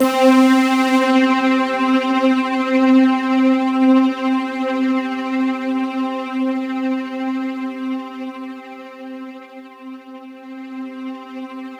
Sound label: C3, Roland JV 2080 CLUB ORGAN